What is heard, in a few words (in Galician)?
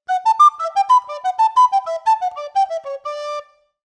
pica triple base, pito galego; articulacion; triple picado